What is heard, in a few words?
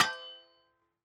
mine metal